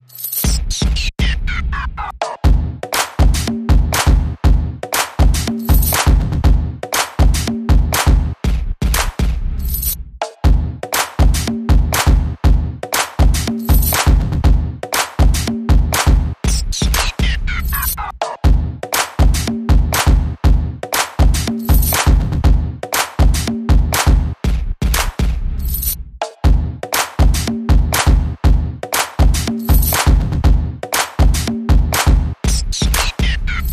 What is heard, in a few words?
Blend This Beat